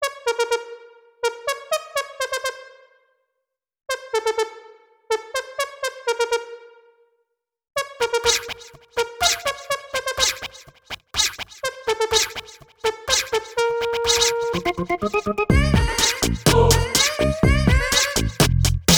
Alien Song; Pit People; BattleBlock Theater

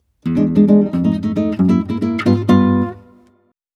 Time Up Warning Fanfare, guitar; Super Mario Bros.; super mario series for guitar 2010

